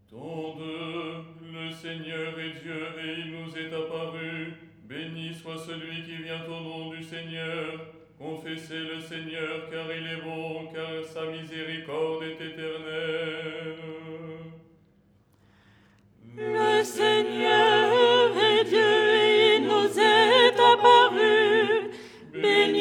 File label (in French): soprano